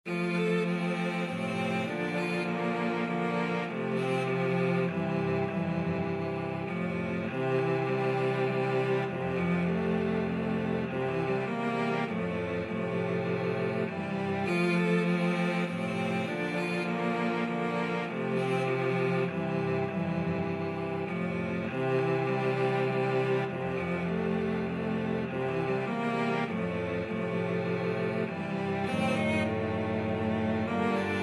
cello quartet

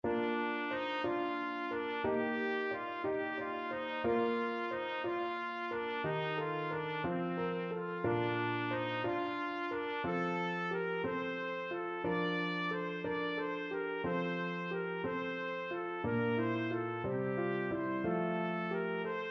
pastorale, trumpet

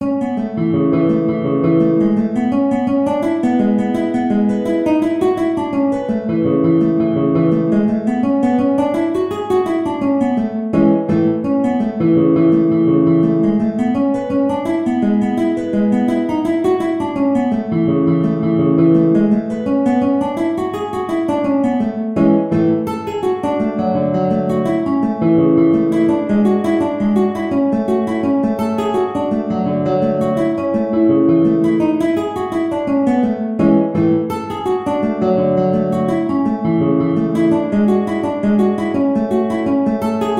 Hornpipe, guitar; President Garfields

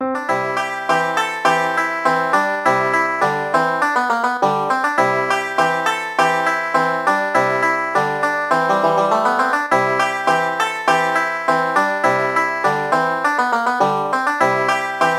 banjo

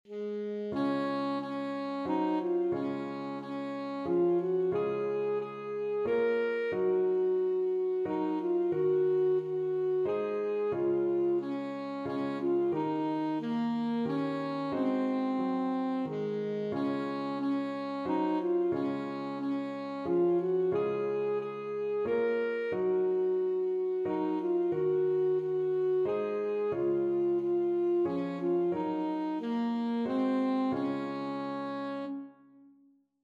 ASAX, saxophone

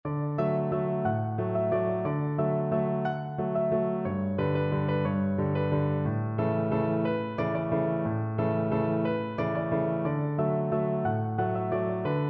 lathe di chadar, piano

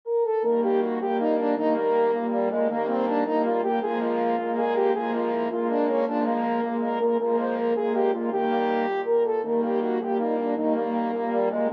french horn quartet

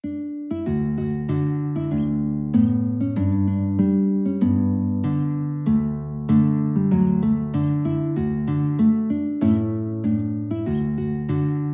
guitar

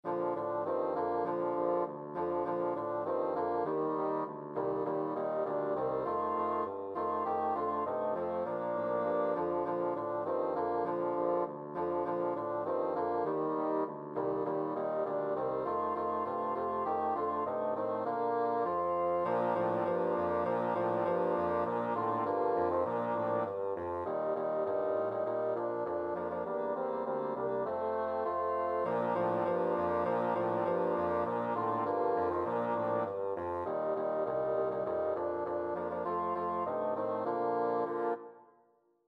jingle bells, bassoon quartet

